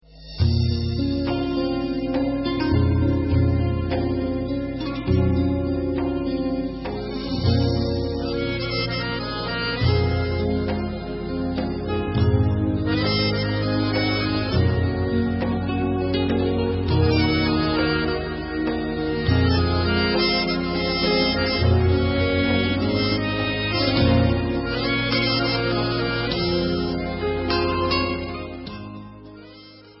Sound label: Instrumental